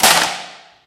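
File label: physics clipboard impact large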